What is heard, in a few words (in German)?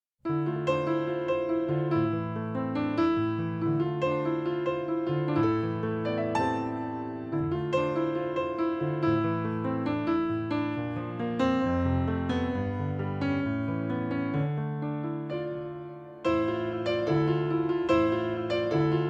Psalm d-moll